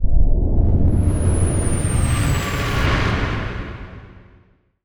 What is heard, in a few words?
comet incoming; dota; crownfall